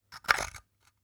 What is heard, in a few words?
coral on granite; prosoundfx